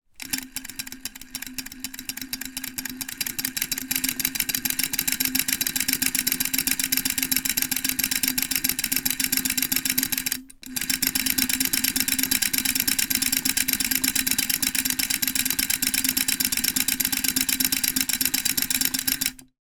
sewing machine toy vintage hand crank operating, household